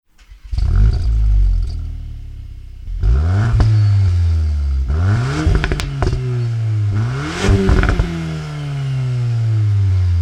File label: VW Golf VIII R; REMUS; Serie